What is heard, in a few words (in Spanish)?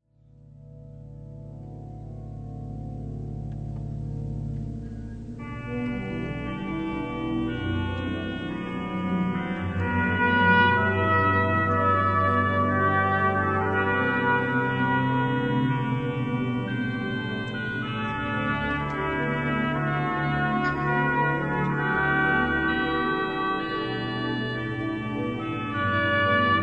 improvisacion organo trompeta; Valladolid; castilla-leon